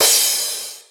Crash

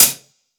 Hi Hat

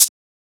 Shaker

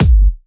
Kick, Kicks; FLPRO

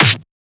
Snare; FLPRO; Snares

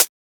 Closed Hat

